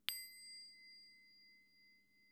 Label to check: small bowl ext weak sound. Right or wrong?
Right